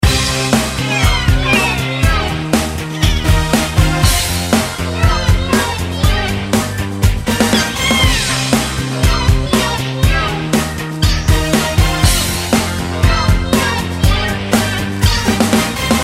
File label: Music Disco; Strikeforce Kitty